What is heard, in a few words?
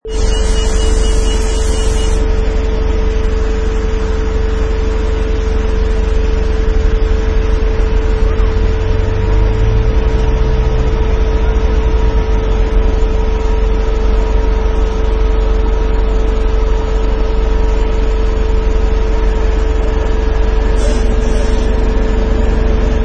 Truck Engine Idle